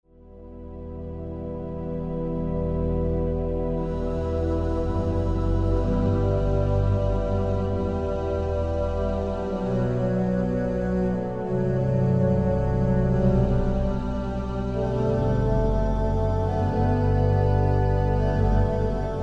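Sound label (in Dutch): Pslam, orgel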